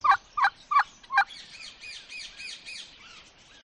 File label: urban farm guineafowl and background birds